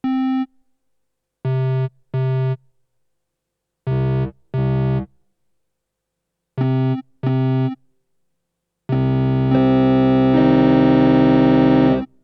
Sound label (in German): Mooer Harmony X2 Synth Oktaven